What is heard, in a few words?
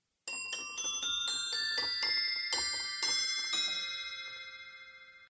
Toy Piano Saucer Bell